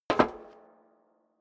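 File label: step dress boots metal rattle single stair up, footsteps; schritte; menschen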